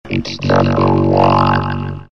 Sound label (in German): Jingels